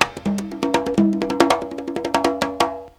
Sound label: PERC RUN; Crypton - DrumBase5000